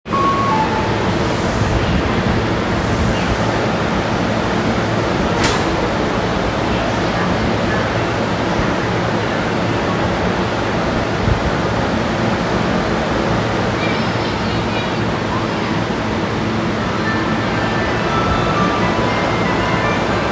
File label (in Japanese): 千代田線千駄木西, train sounds